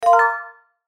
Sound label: CHIME BRIGHT ASCEND; Chimes (Sound Effects:Multimedia)